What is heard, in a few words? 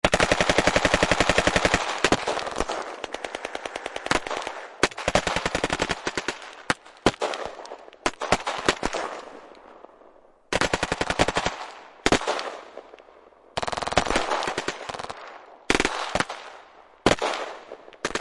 automatic gunfire